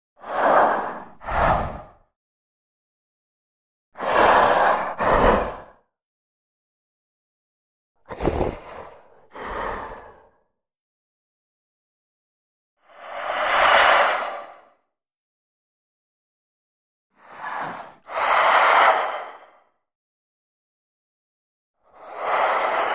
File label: Beast Breaths Vario, monsters